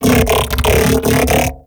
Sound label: Mech Ambient Vocal